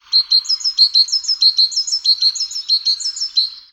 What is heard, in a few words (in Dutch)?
Koolmees Zang Nederland